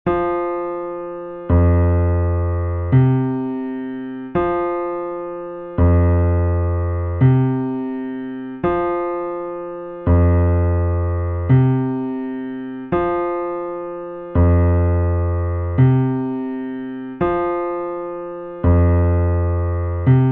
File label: impulse heel sitbone; note resonances